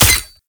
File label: Pistol Alt Fire Charge Full; Weapons; Scaldra